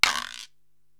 Guiro Long

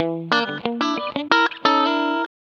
COUNTRY

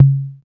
Low Tom Bass; EMU MoPhatt

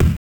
Kick, Genesis; Sonic Spinball; M8

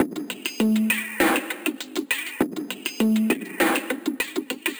Ghost Loop 100BPM, drum loop

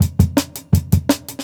Jungle Breaks

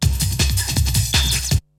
BREAKBEATS, TECHNO

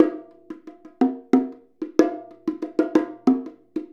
Bongo; Essential Percussion

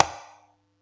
Yamaha EX5, Drums

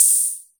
sfx perc

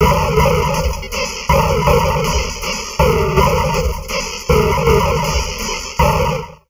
Drum Loops Crazy Processed

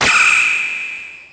G3, Crazzy Perc Set, CASIO FZ-1; Retro Sampler

